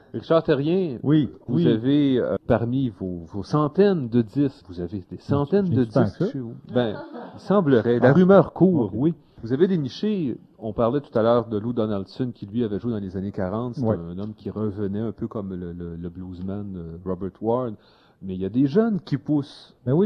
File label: Jazz; CIBL; eclaireur